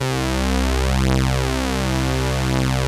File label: POLY SYNTH; Wizoo - Powered Wave